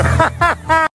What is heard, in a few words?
hhhh ha ha